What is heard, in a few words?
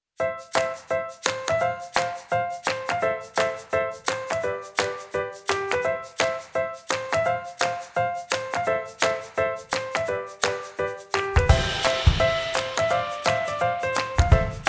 bg music